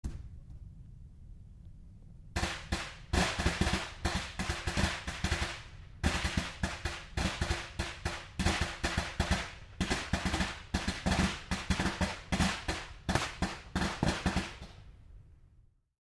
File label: FIRECRAC